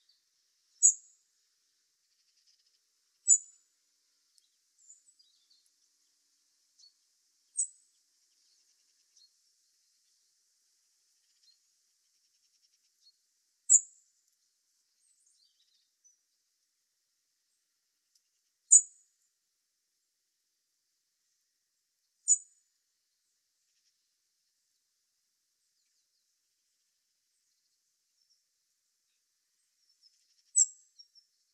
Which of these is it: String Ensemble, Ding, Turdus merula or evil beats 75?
Turdus merula